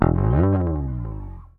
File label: SLIDE, Bass; Sampleheads; Will Lee Bass Library